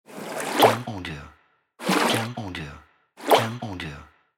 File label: Water Splashing